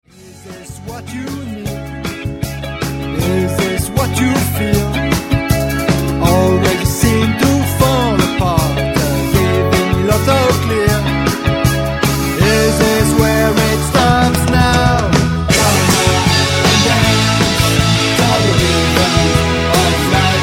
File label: rythms